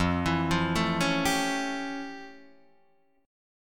F Augmented Major 9th, Guitar, Open D

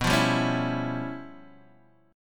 C sharp 7th Sharp 9th Flat 5th, chord

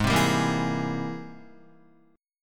Ab Augmented Major 9th Ab Guitar; strummed; Ab+M9; JGuitar

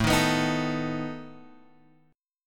A Suspended 2nd Flat 5th A down Guitar Open G

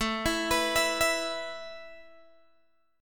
A Suspended 2nd A Guitar Open G

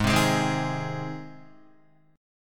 Ab Major Ab down Guitar; strummed; JGuitar

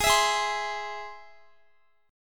G sharp Augmented G sharp Guitar; strummed; x,x,20,21,20,20

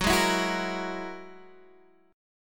G sharp 7th Suspended 2nd Sharp 5th

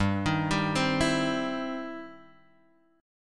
E Minor Major 7th Sharp 5th G

